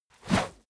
whoosh dirty; swooshes-swishes

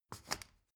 step ladder wood scrape hard single; schritte

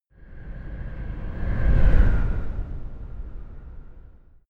sci-fi vehicle train passing fast long; raumschiff

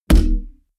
heavy saturated damped, hits impacts

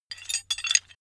metal handling pieces

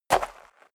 step dress boots gravel light single left; Footsteps; schritte

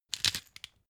glass pepper grind hard; berufe; gastronomie; soundpool

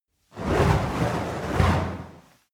fire torch whoosh flutter long; swooshes-swishes

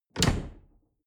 dishwasher door snap open

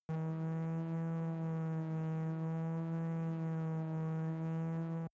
space buzzing energy beam

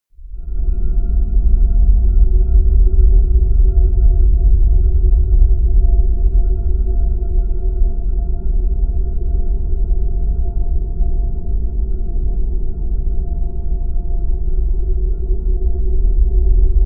drone deep and calm

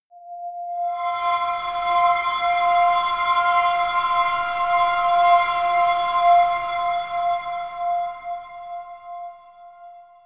scrapes ringing blitter harmonic

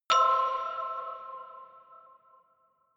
notification glockenspiel creepy third

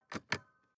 sports car int switch press and release; autos; verkehr transport

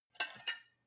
cutlery take from plate soft; berufe; gastronomie; soundpool

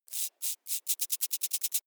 creature movement tiny; bewegung; comedy-cartoon; soundpool

